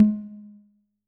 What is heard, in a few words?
Tom